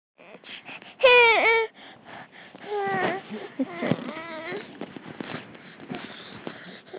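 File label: baby hungry, male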